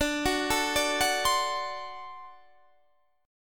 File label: D 7th